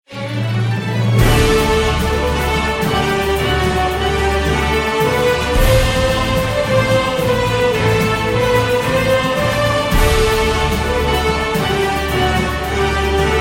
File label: WIN MAX; Alchemists Secret